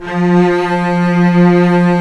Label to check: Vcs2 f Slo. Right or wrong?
Right